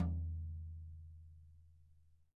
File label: Tom, EMU PX-7 Drum Machines